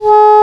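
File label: English Horn p G#3; Digital Sound Factory Virtuoso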